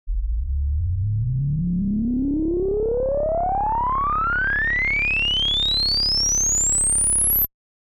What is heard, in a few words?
Ladder Reso; synthmaster